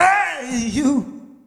HEY VOX, Roots Disco; USB Soundscan vol.32; AKAI

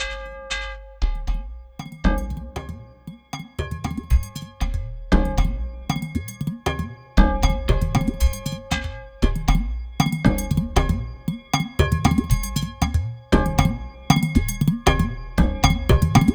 Quantum, Processed Percussion

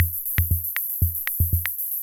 Loop, classic machines, rhythm77

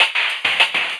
Wah Bumpers; Sonic Foundry (Sony Creative Software); Esoterik Beatz; Wah Fx

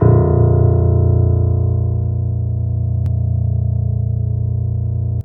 PED MF A, GRAND PED; Best Service Advanced Media Trax Modern Composer

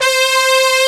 Section Brass C4; Proteus 2000; Digital Sound Factory Proteus 2000

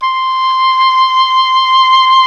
WND OBOE2, Oboe vib; Roland LCDP04 Orchestral Winds; Oboe 2&3

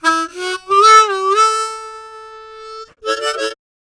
Harm 098bpm A, Harmonica

